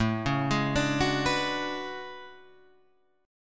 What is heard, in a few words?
A Major 13th, down Guitar Half Step Down